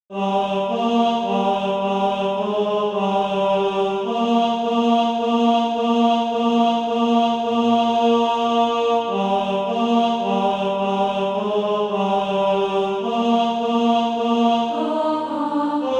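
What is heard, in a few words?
tenor, Practise Music; SATB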